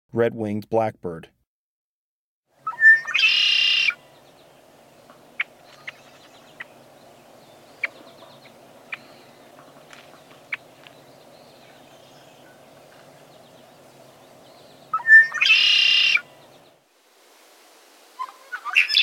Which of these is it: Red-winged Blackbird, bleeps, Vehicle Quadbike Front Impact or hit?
Red-winged Blackbird